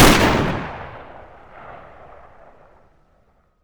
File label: rem870 fire, shotgun; weapons; garrysmod